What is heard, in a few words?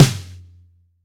long room reverb snare sample C sharp key